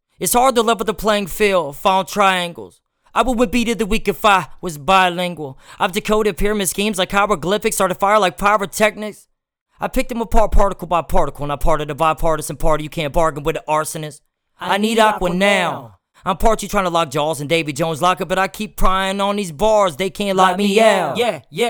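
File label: hip hop 150 A minor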